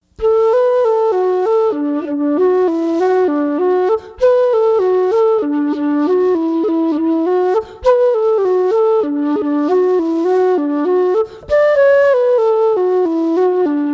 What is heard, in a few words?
Slower; Humours Of Glendart